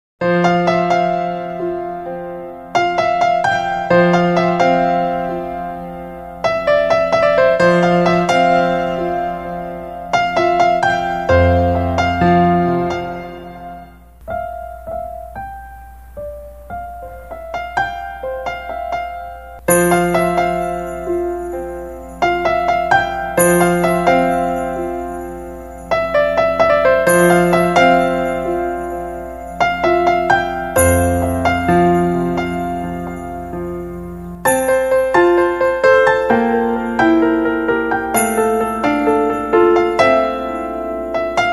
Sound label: Playing Piano At Church; Thaandavam; Tamil